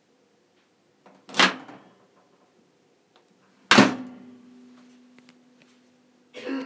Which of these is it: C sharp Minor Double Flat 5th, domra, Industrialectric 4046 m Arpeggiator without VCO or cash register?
cash register